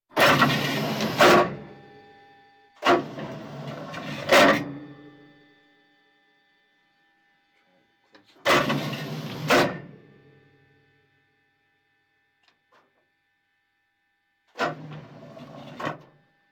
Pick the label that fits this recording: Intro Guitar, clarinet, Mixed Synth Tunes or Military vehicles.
Military vehicles